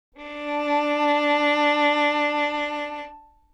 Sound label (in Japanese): 押弦によるヴィブラート, 擦弦; D4; 弦鳴楽器